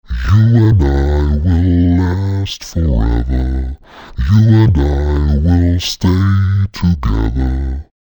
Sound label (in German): Gesangsspur Low Oct